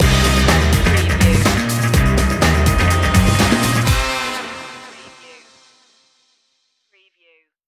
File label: retro quest stinger